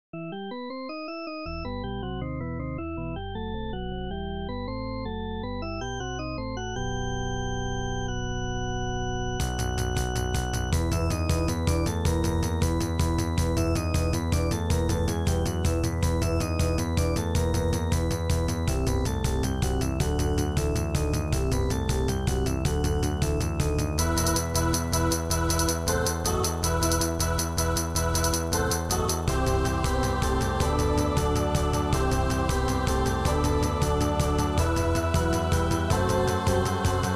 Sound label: Battle Fugue; gamemidis